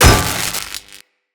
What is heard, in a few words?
Electro Prod Hit; Weapons